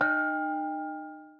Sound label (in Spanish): CAMPANA EN SAN REGIS CHINCHA UN TOQUE